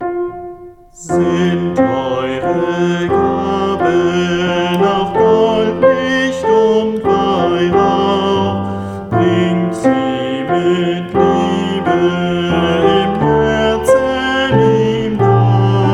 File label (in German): Wiegenlied, adventsmusik sopran